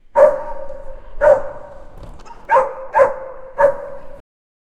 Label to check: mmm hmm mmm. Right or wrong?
Wrong